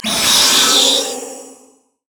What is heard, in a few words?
wraith angry; LoL; monsters